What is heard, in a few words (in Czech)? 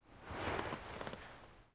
posazeni postel; Mafie 1